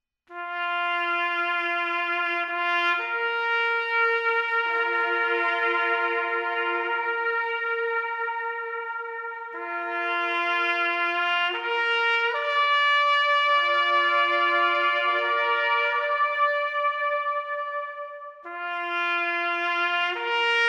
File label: taps